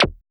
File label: SCRATCHY Q; HIQ+ZAPS